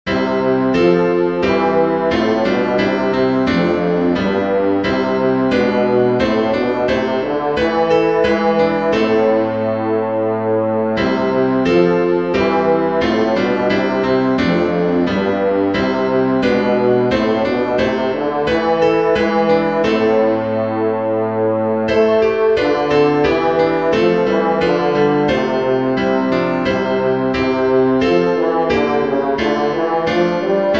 passion chorale bass; by Hans Leo Hassler (1564-1612)

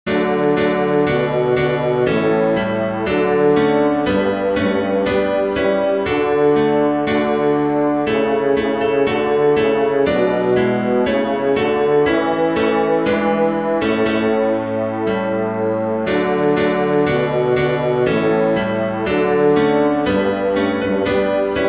nicaea bass; Tune by John B Dykes (1823-1876)

